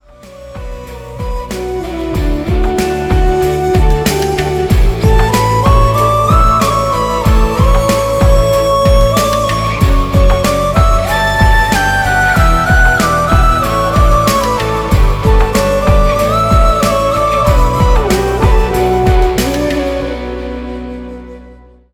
Intrumental Ringtone

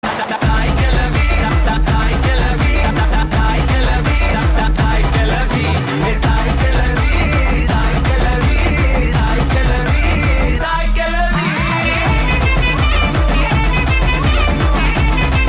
Bgm Ringtone; Thiruchitrambalam

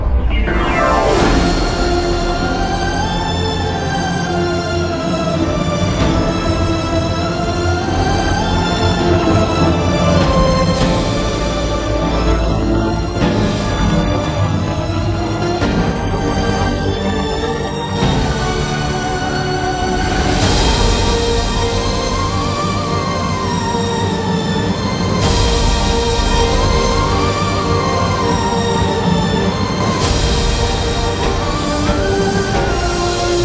instrumental; JOKER

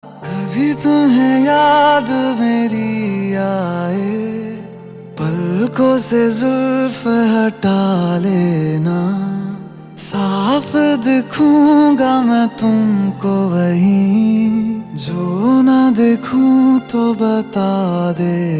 New Hindi Song

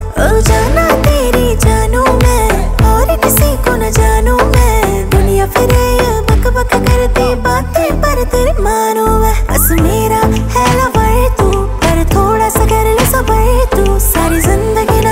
Slow Slow Ringtone

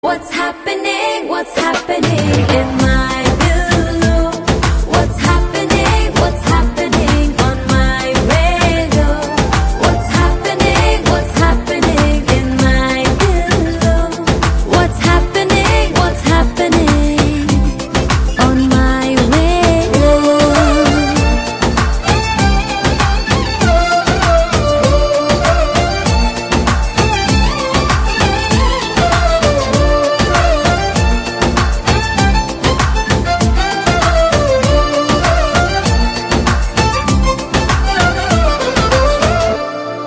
Song BGM Ringtone; Dhamaka Movie

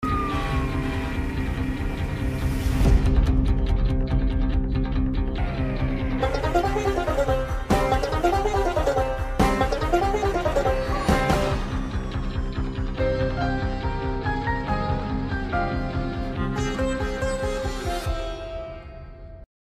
BGM Ringtone; The Family Man

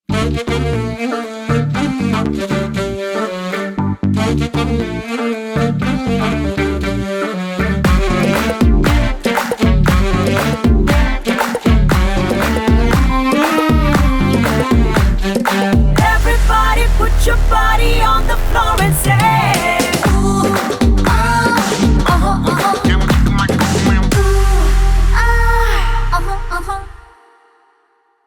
BGM Ringtone

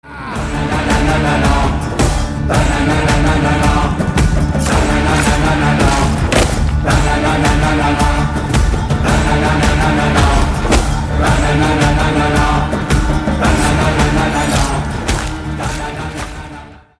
BGM Ringtone

